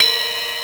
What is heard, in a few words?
Casio SA-10 Ride